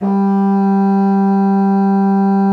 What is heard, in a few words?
BASSOON G3; Xsample VOL-6; C BASSOON